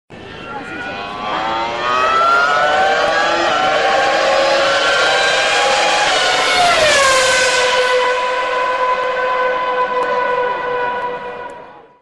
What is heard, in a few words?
formula 1 sound